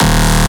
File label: Bass Shot D#